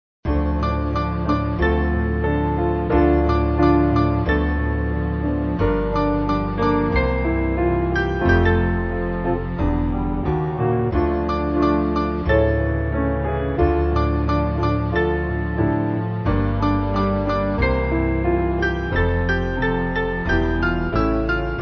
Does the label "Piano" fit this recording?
Yes